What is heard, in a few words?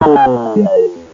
talk